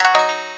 gayageum, keytone

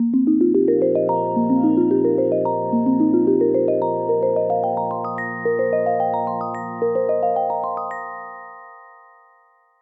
alarms abstract; Amazon Fire-Phone

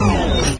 pad shrink; LG GW820 eXpo

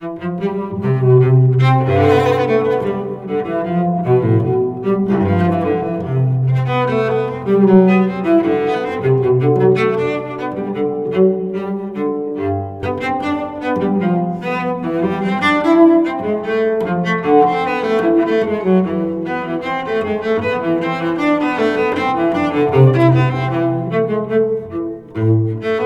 Bach Cello Suite Bouree, alarms; LG Smart Folder X100S

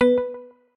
Progress, notifications; BlackBerry; KEYone; BBRY

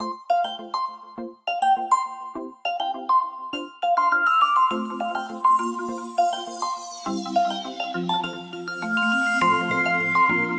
Alarm, phonetones alarms; LG; G-Flex; Life Is Good

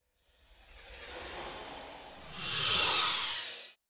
start, phonetones Samsung; GT-B7320 Omnia PRO ATT

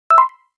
Error; Nokia; 6708

